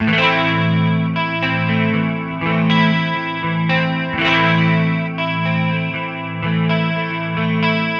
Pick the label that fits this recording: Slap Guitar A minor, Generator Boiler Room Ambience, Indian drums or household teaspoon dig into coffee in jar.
Slap Guitar A minor